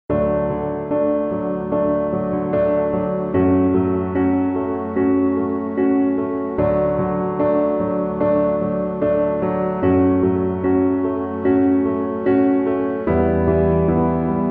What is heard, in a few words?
Piano Accompaniment